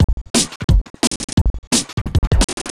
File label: Glitched Beat 175BPM; glitch kit